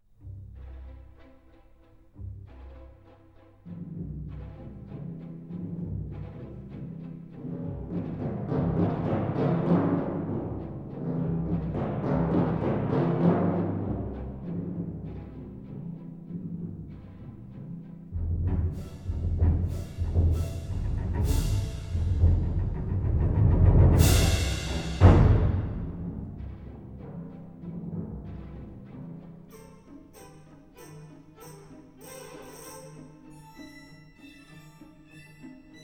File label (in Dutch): Timbalen; Instrumenten orkest